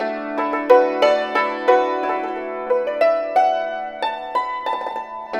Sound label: YNCHIN 90 D; INIS - Opium